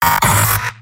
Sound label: soldier pain sharp; vo; tf2; mvm